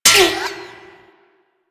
parry bullet; Warframe

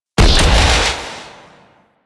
Sidearm Charged Shot; Weapons; Warframe; Tenno; Wraith Sidearm

